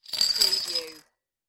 Beer Bottle Tops Being Dropped; beanstalk audio

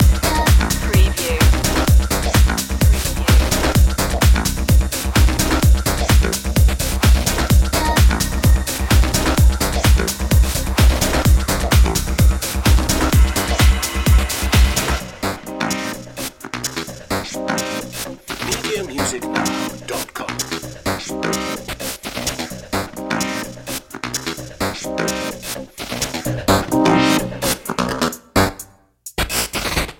groove station

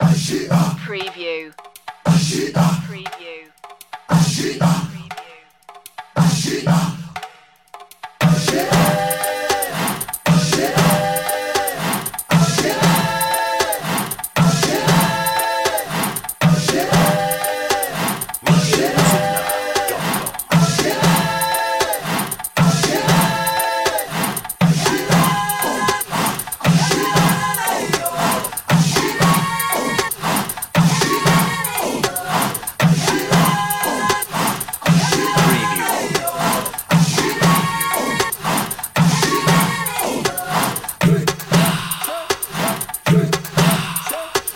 tribal celebration 117bpm

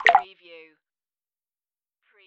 wet; Button / Multimedia Sounds

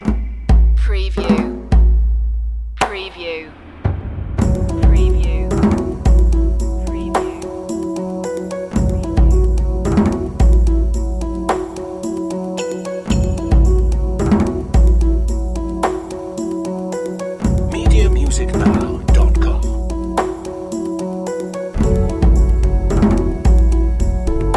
river adventure

